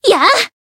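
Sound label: attack, Japanese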